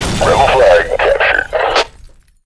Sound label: blue score; sourceforts1941; ctf